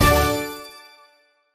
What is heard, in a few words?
wanted spin free spin number; Sheriff Of Nottingham